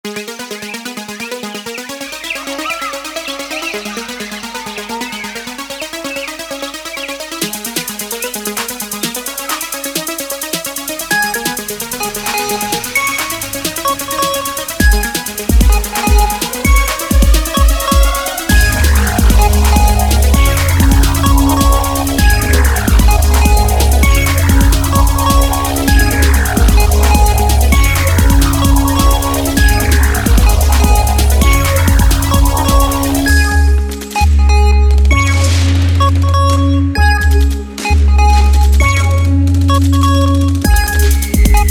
Abstract EDM

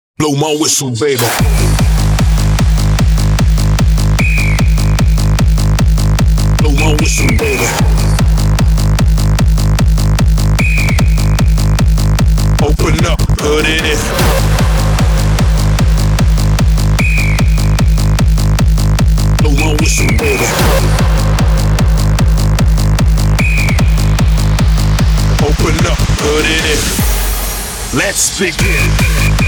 Hardstyle version

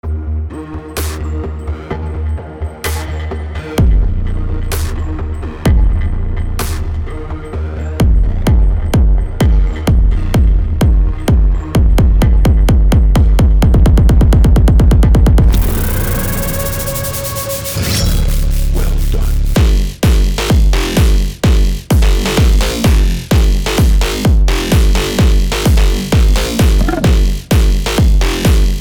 Dark Hard House

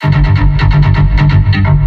Bass

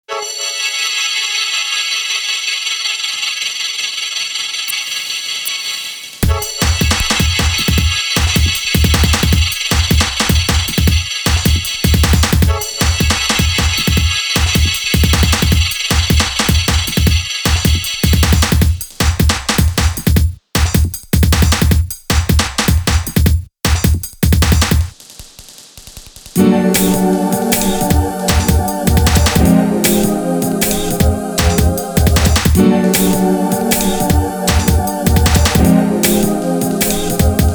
Atmospheric

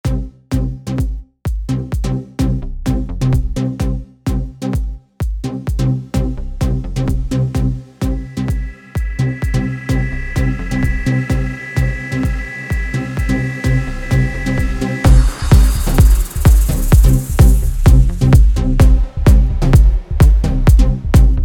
Test House

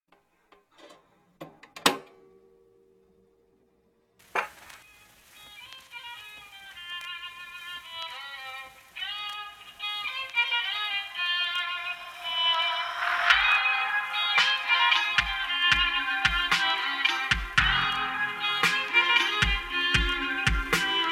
Violin

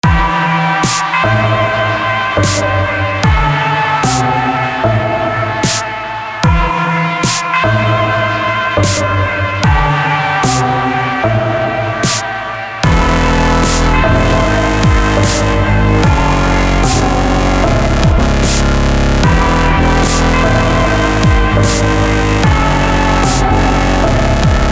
DubStep

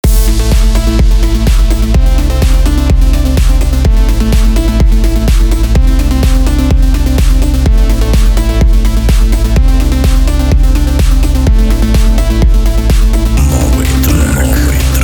Atmosphere

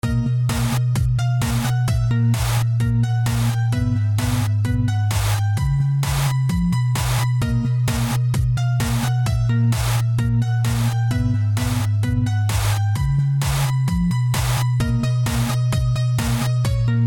melody track

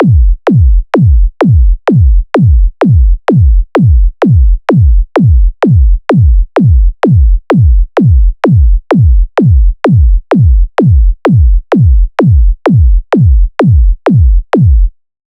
Beat 128 bpm

